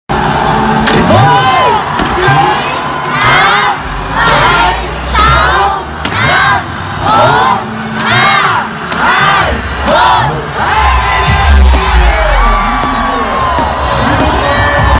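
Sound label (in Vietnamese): Đồng hồ đếm ngược 10 giây chúc mừng năm mới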